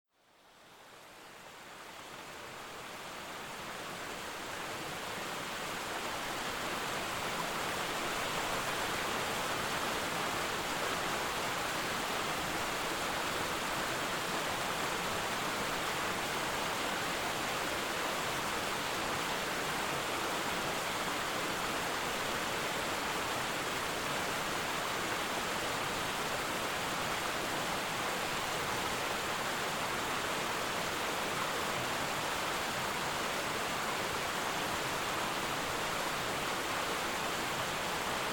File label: waterfall